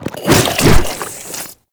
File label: Strike Flesh; Enemies; Abilities; Warframe; Proto Infested Ancient